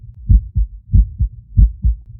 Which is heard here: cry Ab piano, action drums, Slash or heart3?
heart3